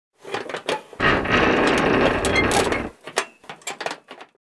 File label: creak metal